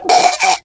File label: choke, biker; voice